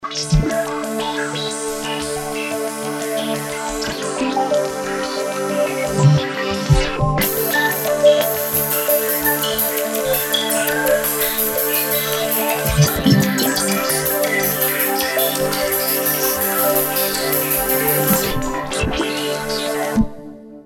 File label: moving wave, roland jd800; card demo